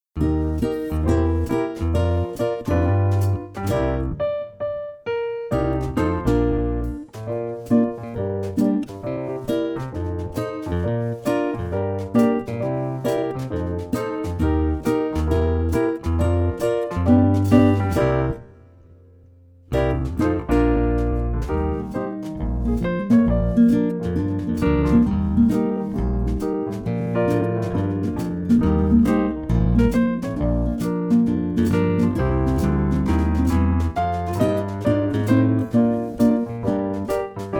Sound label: saxophone; mib